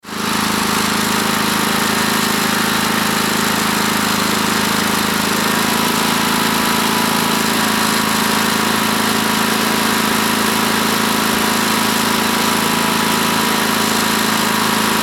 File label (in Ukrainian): zvuky generatora